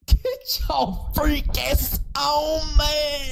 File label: freaky aah